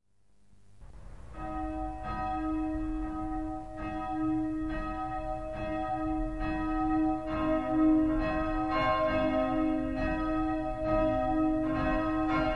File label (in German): glocken